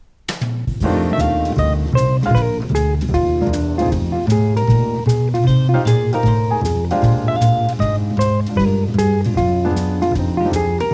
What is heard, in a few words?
Trio